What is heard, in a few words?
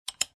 click